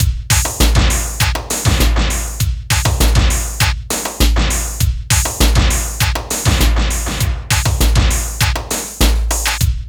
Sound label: TECHNO, Funky Xtreams; INIS